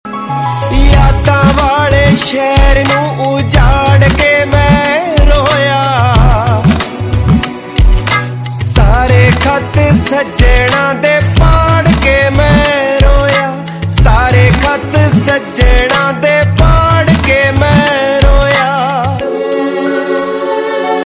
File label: Punjabi Sad Tones; Ringtones